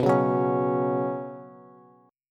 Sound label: B Suspended 2nd B down Bass, Bsus2 chord; strummed; JGuitar